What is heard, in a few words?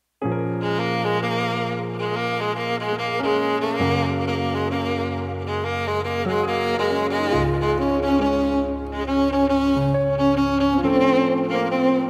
Violin Cover